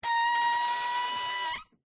balloon air release deflate from valve hiss squeak; foley